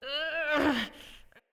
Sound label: Grabs Acorn Vocals Female; Bromius; Wazard; Envoy